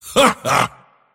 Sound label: mars laugh; dota